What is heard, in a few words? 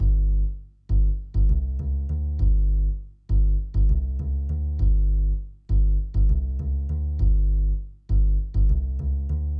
Bass, 100 G